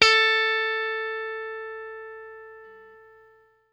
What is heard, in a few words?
blue pop, guitar; Quantum Leap; 90's Sample CDs